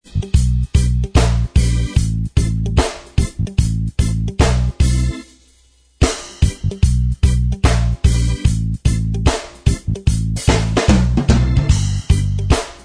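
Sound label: reggae style instrumental track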